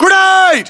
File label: grenade; fgrunt